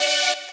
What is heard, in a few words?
saw